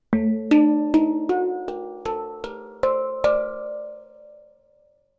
son hang gamme

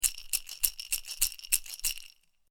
son Maracas; Percu; Tapirape; Duperrex

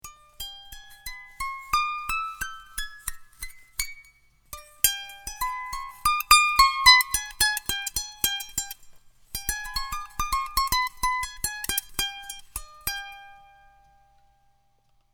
son Valiha; Corde

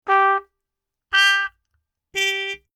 Cornet G 3x; Trompette; Duperrex

